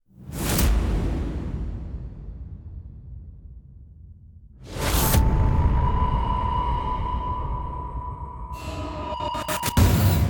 percussion only

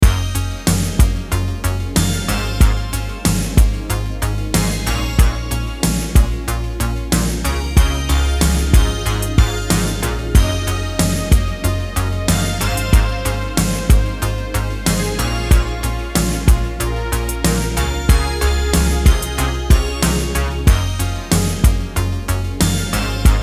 synthwave mix

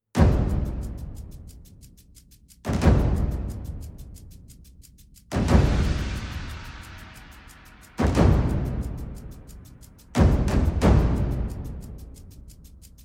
perc only

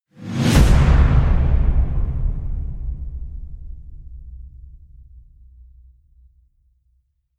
slams with whoosh

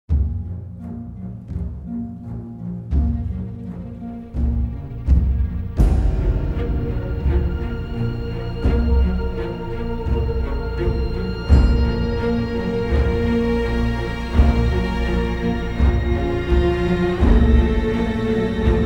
nocturne for solo violin

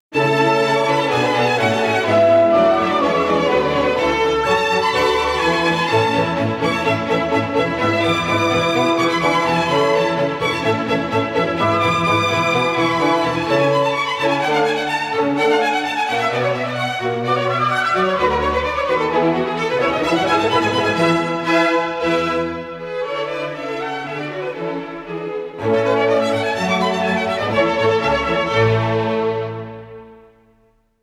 concerto for clarinet allegro

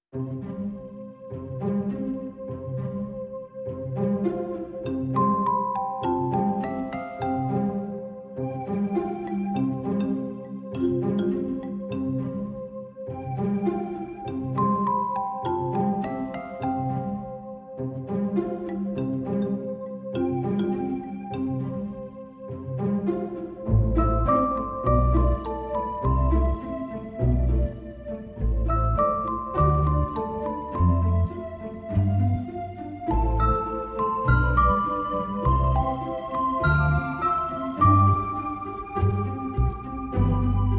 no perc

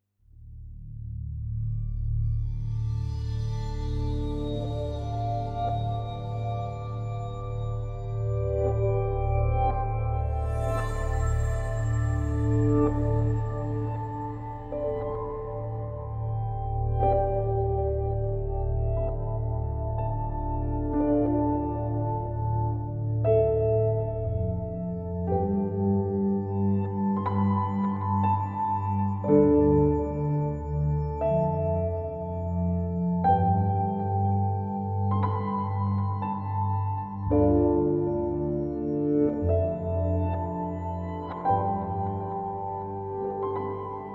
piano instrumental

